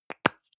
deo can lid open hard

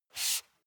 step barefoot wood parquet slide short; Footsteps; schritte

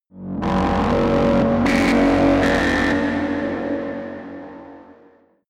sci-fi cosmic strong dark energy, horror

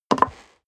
step high heels wood creak jump

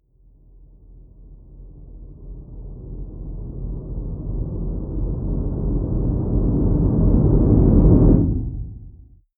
deep noise synth rumble short, Risers; whooshes rises

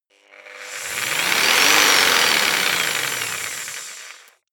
jigsaw engine medium fast short; maschinen; saege

